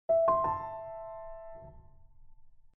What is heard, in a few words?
message; Verykool; S700